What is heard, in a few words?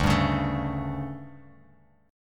D Major 7th Suspended 4th, D, down, Bass Standard; DM7sus4; strummed; JGuitar